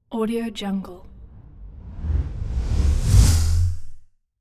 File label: deep reverse with no tail; hollywoodedge